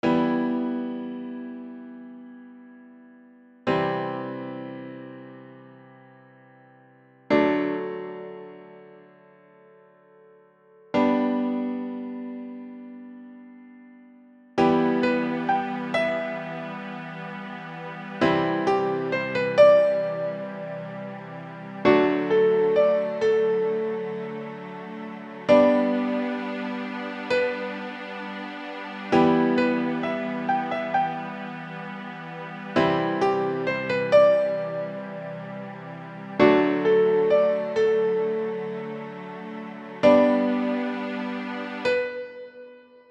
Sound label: Sad Song; OpenBundle; Background Music and Loops